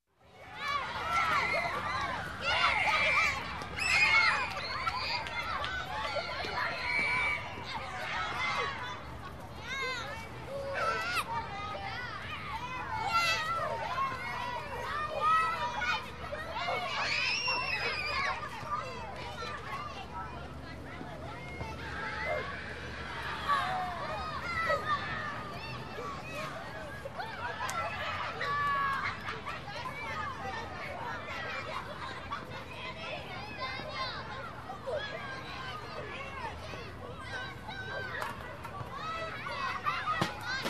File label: Kids in the playground cheering and playing